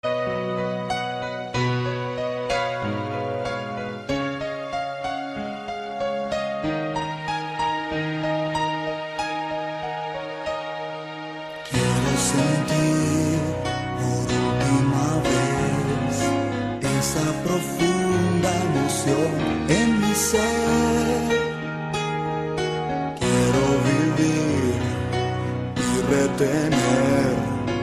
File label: Paino